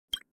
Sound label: click